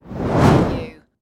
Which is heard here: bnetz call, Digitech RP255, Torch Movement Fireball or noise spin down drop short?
Torch Movement Fireball